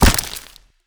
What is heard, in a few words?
Egg Hit; we are the dwarves gamerip 2016